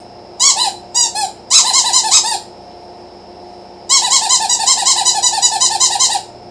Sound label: squeeker, toy